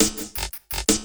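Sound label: LOOP snare drum, Drum & Bass, 170 BPM; Ueberschall; Drum & Bass Resonance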